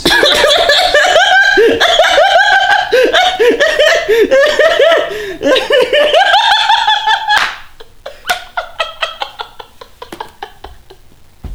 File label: laugh; ph enhanced; taunts; garrysmod